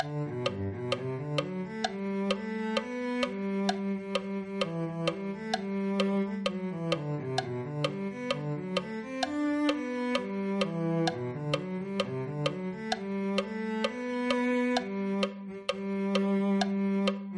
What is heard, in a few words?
cello